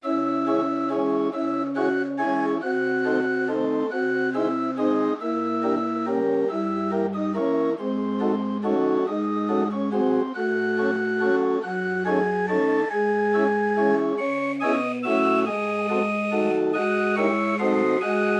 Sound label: Title Music